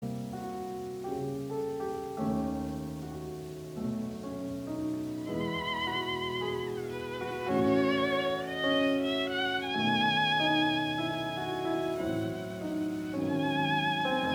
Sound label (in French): Sonate violon et piano; Lekeu